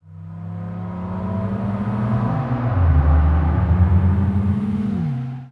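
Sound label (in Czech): autíčka jedou; Mafie 1 cz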